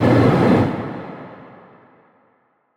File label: CARRIER attack